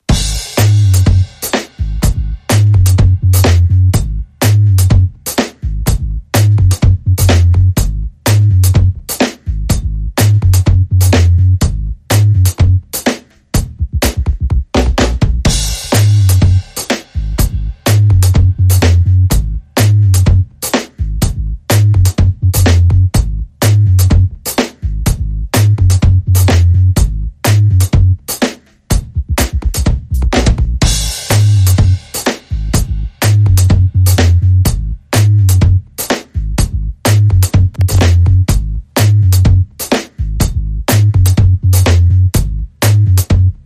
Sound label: Drum & Bass Only